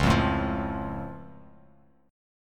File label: C sharp Augmented down Bass Standard, chord; strummed; JGuitar